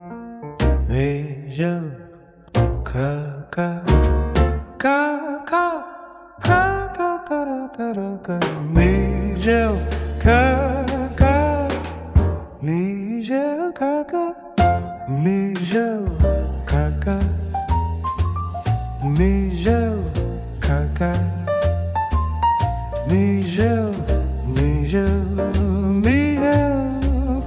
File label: jazz